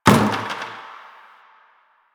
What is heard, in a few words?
Destructible Bucket Impact; Warframe; Ambience; Nineteen Ninety Nine